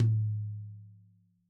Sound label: tom hi; soundscrate